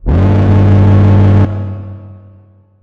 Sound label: Battle Alarm; Electricity; Thalassophobia Restored